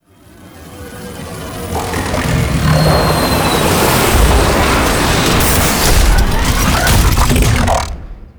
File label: Mech Entrance Mech; Quests; The New War